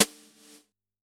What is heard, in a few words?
brush, jazz